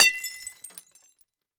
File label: Crash Glass; MinorHallutinationsSounds; SFX Producer